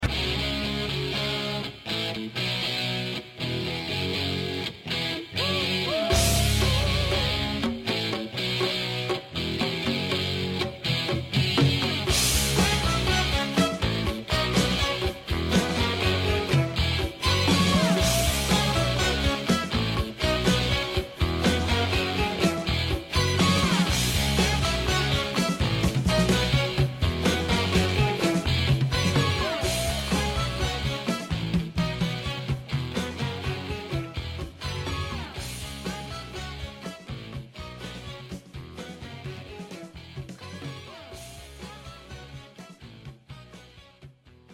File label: Riff